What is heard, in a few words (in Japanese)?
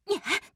攻撃